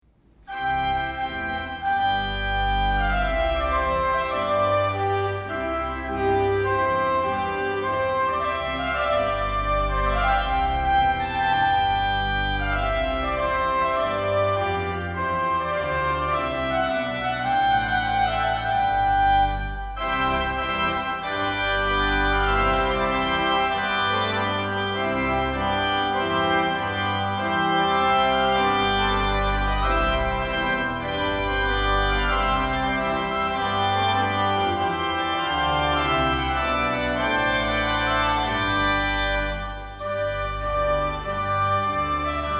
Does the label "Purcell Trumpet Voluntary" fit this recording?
Yes